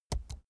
keyboard laptop key press slow soft

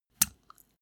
step barefoot wet hard

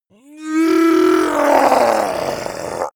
zombie human change

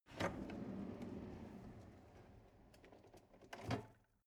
drawer medium open draw long

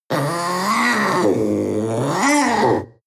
panther young vocal yowl

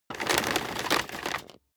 vacuum cable pull in

